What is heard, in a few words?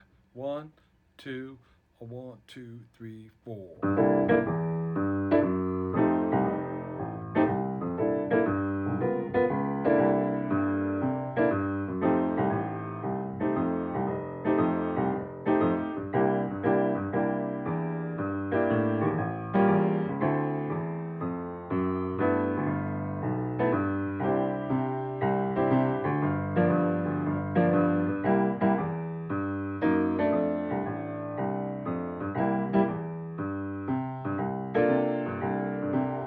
swing